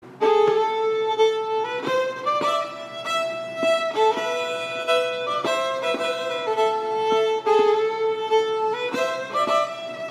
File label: Polska; Polska Efter Lisa Furubon